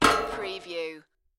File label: Metal Impact - Drop Metal Pipe On Metal